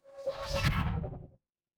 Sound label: magic scifi teleport